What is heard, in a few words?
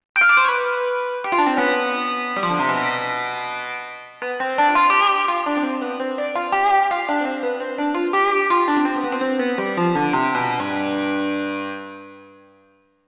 sitar